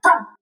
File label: Stomp